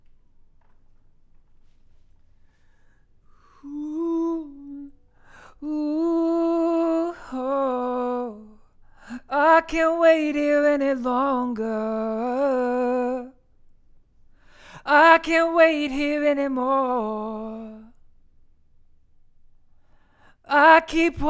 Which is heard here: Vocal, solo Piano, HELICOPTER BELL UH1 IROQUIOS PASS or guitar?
Vocal